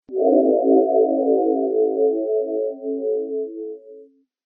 synthesizer

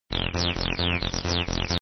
synthesizer

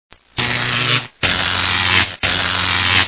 electric

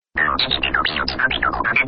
synthesizer

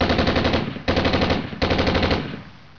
auto gun

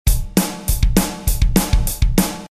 drums

